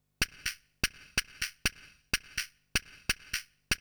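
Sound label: percussion, house, 125, drum; eJay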